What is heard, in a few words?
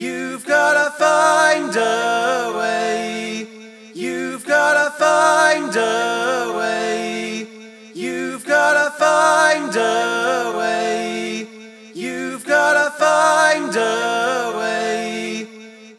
Lows, 120, F; Find A Way